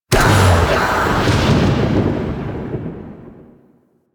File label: Rising Blades Impact C; Warframe; Warframes; Pax Duviricus